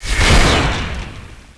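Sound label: frost activation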